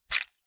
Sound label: Squelch